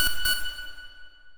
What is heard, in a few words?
fami f#4